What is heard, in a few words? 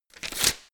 tear thin paper